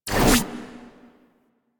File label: Amp Chassis Fire End; Warframe; Operators; Amplifiers